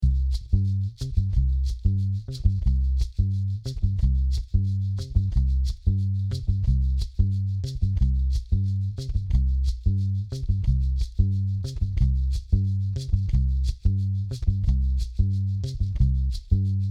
Tresillo

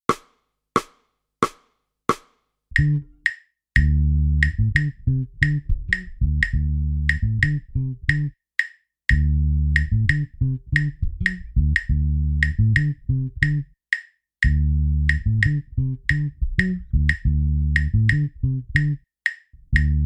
tumbao 3-2 off clave

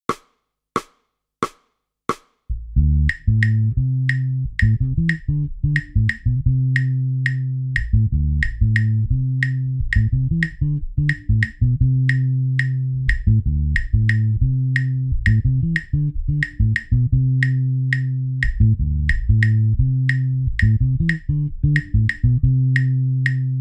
tumbao 3-2 off clave

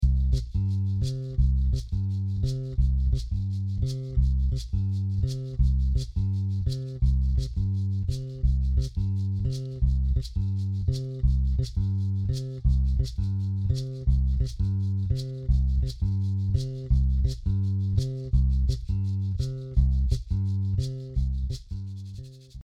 Guaracha

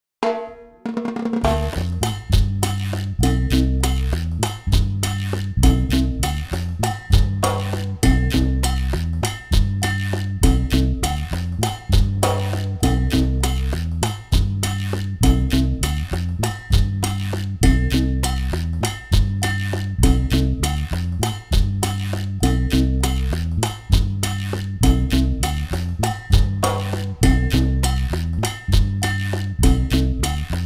Chachacha

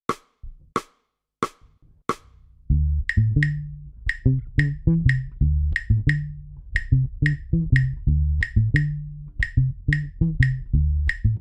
tumbao 3-2 on clave

